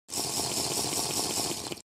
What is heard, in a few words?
drinking sound; minecraft